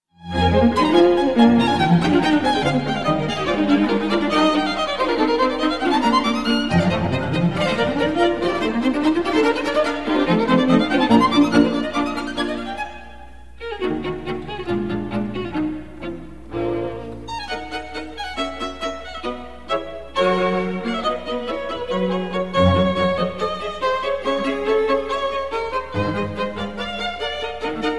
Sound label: quintet in E flat major K614 for strings 4th movement allegro; Wolfgang Amadeus Mozart